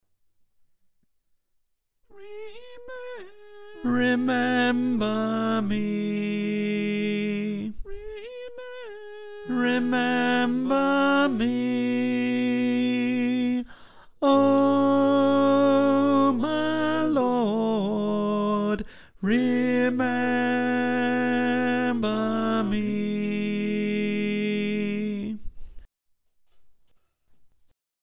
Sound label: Tenor, choir